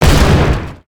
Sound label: terrain blast; creatures organic